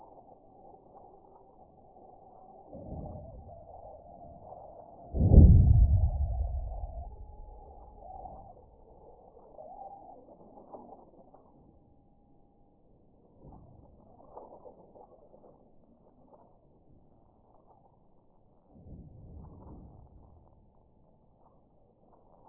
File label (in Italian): San casciano VP, Sonificazioni Eventi Sismici; Questi suoni sono stati costruiti con dati grezzi arrivati al sismografo e convertiti